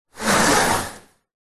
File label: household window modern slide down close; zapsplat